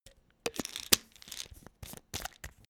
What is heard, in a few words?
pillbox close